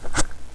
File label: item pick; HALFE LIFE 3; aard